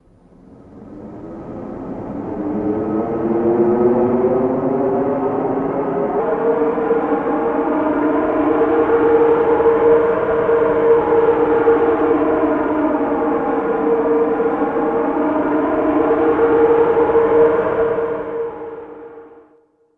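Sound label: air whirlwind sound, nature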